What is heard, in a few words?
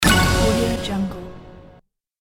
action jingle button